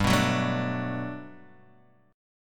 G Major 9th, G, down, Guitar, DADGAD

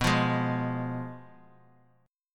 F Diminished B

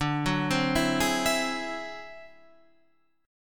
D 9th Flat 5th D Guitar Half Step Down

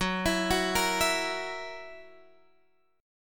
Gb 7th Gb down Guitar Open G, chord

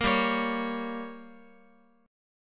G Diminished, Guitar; strummed; JGuitar

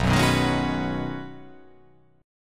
B 6th Add 9th B, chord

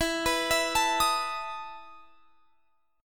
E Major 7th Suspended 4th E down

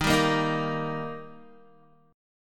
D sharp Major D sharp Guitar, chord; D# strummed; 11,10,8,8,10,x

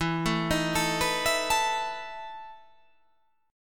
E Major 7th Suspended 4th

